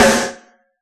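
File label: industrial kit snare; Giga Samples Collection; Dr 202 Kit 1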